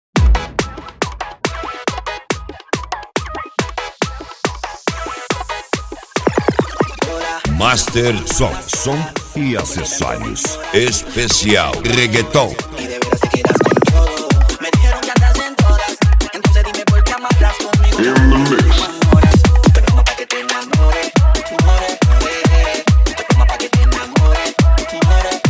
reggaeton

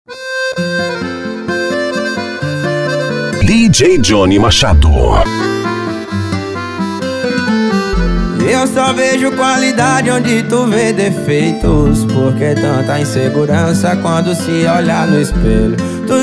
forro e brega; vazante mg

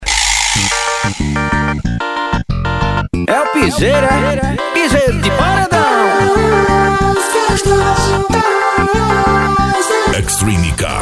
piseiro e sertanejo

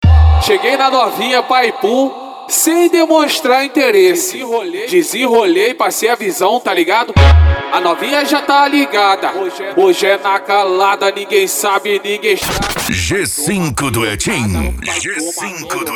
mega funk e funk mega bass

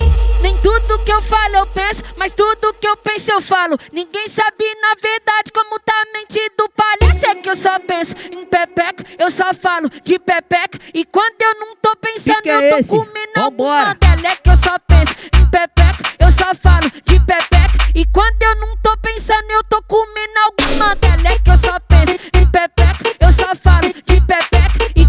funk sertanejo

